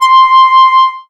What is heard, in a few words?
lead C6, Casio VZ10M; Polyend; Simulacrum Sounds; 1 Shots; Synths; Leads and Keys